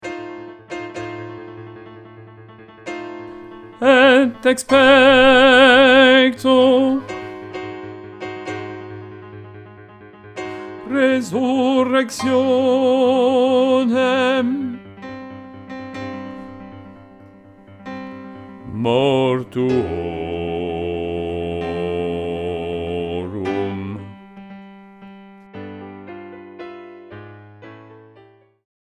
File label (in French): basse Credo